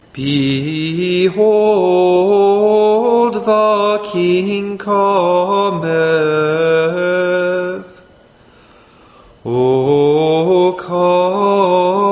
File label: Invitatory